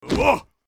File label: Character Black Attack; Angry Birds Epic